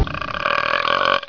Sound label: BURP